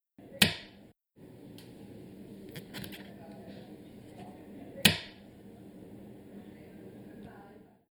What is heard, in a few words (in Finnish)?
magneetin laitto